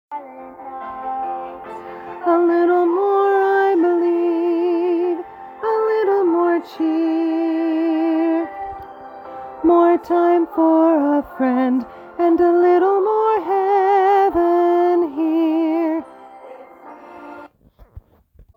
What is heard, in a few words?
last chorus